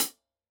Hihat Closed Tight Side

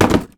rock hit; Gish demo

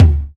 Tom MPC; Drums

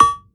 Bell Chop; One Shots; Drums; Ableton Live 12 Lite; Core Library

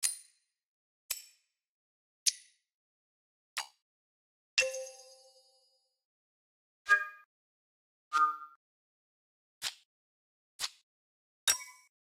menu sounds

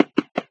pat thighs; There; ThereClient

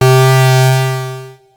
ARP 5th - C3, Synths; Multisamples; Ableton Live 12 Lite; Core Library

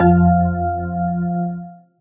rock loop; There; ThereClient

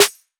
Snare, drums; Ableton Live 12 Lite Core Library

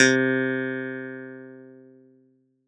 Clav Anvil C3; Piano & Keys

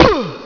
fall, male; Digital Paintball 2

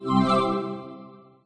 login logout; There; ThereClient

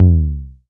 Tom MKS, Drums

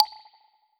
Clave Spring

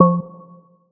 E-Perc Techno Bell, Electronic Percussion, Drums; Ableton Live 12 Lite; Core Library

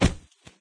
body fall; There; ThereClient

